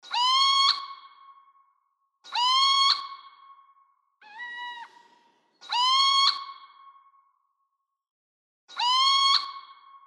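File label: little owl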